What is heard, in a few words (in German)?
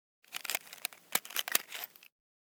Streichholz rausnehmen